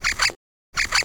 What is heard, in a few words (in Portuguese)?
Capivara